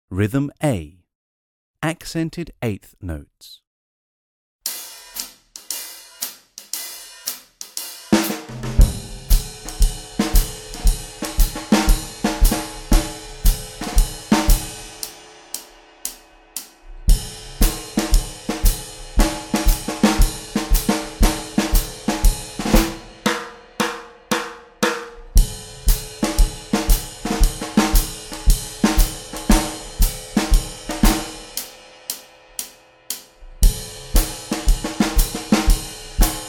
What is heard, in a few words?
Rhythm Accented Eighth Notes, tap grade 1